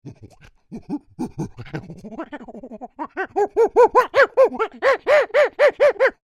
Creature Monkey Grunts Excited, Human Imitates Monkey; Monkeys; Big Room Sound